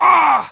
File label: wound, stalker